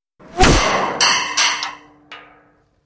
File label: Bullet Impacts Sheet